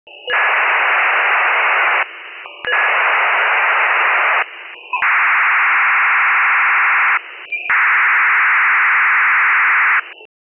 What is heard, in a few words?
faxmod